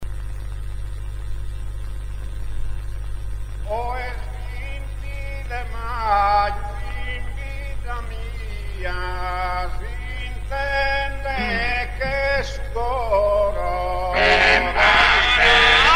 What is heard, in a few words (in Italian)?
passu torrau, teneore barbaricino, orgosolo